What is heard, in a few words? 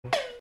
headshot